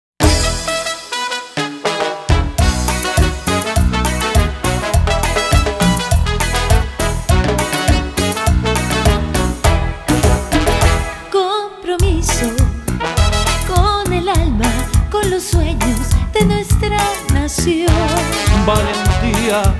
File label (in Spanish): Tema de campana Cumbia